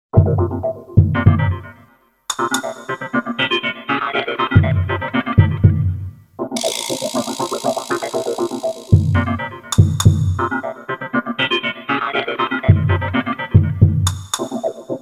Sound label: Space Trans; Soundzabound